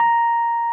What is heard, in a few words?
RHODES A#4; Sonic Reality - Vintage Timetraveler